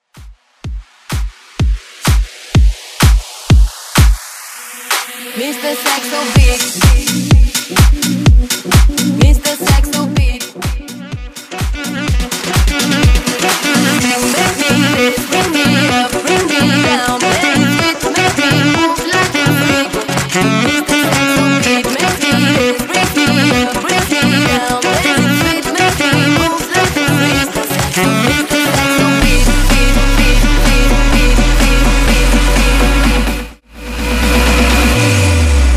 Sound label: Bootleg Mashup